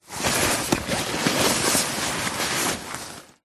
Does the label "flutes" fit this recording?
No